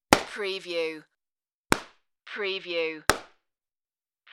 air balloon pop

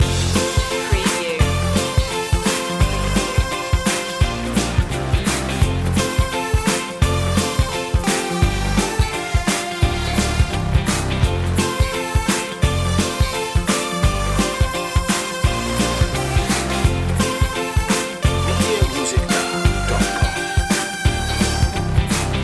sunny afternoon loop

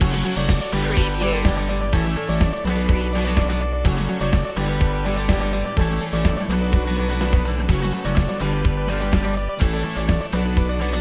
inspirational acoustic guitar loop

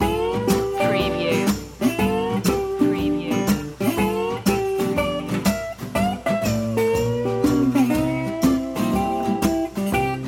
star spangled blues loop

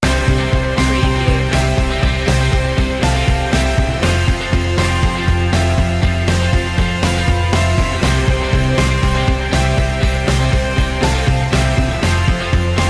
anthemic rise

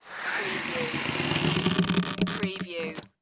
Balloon Blow Up; sound effects bible

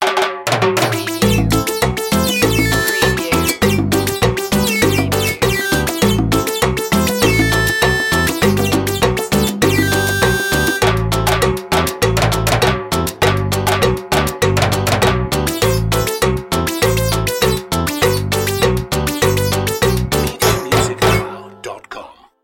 cheesy cumbia

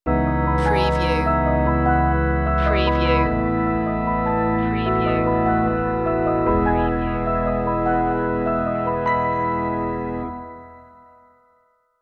rising light stinger

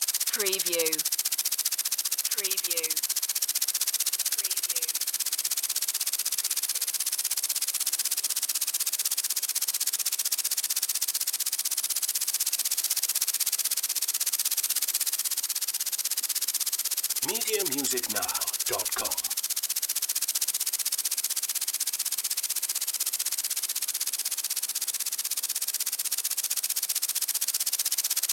lawn sprinkler fast loop

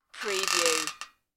metallic bed squeak

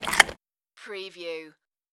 dog creature chew

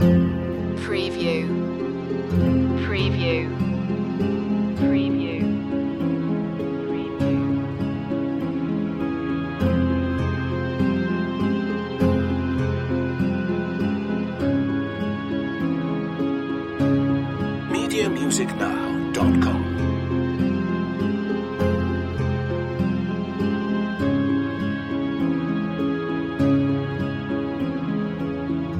lost city loop

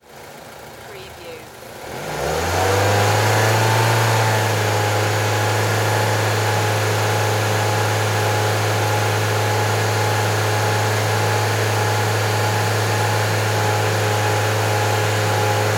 Car Mazda 3 Medium Rpm

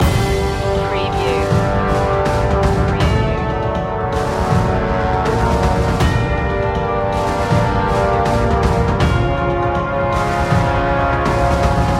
land loop

